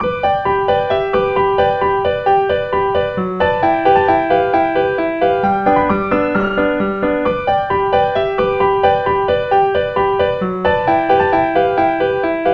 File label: Accompaniment